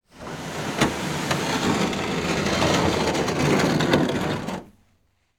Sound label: rowing boat pull ashore; transportation